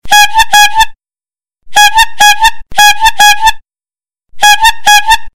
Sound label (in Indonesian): suara roti, ringtone